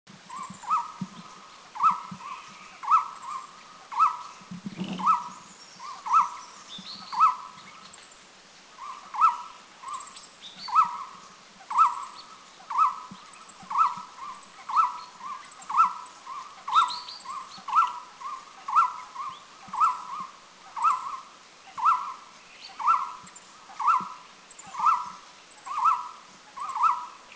Golden-throated Barbet, Doi Inthanon national park in Thailand